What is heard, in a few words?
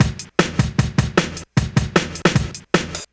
drums, Hardcore Breakbeat, 153 Fmin; Big Fish Audio; Hardcore Breakbeat Action